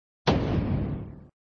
Sound Door lock, flipper

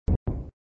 Sound SFX, flipper

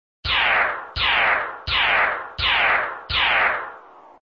Sound SFX, flipper

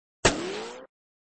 Sound Gun miss, flipper; Gun miss v1

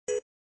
Menu sound System Select; System Select D (Menu System Entry); flipper

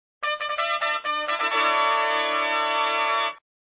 Start adventure and Sound System Jingle; Start adventure and DCS Sound System Jingle; Indy

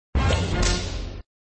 Sound Fanfare End of Ball with whip; Match!

